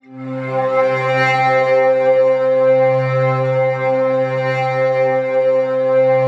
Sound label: Blowing Pad; Cymatics; Noise Osc Samples for Serum